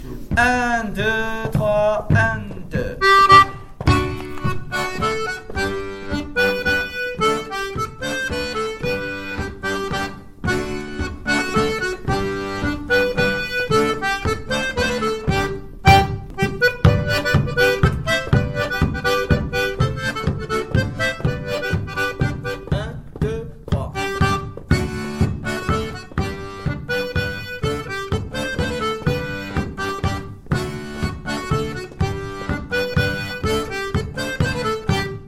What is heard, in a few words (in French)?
Mazurka enchainement intro theme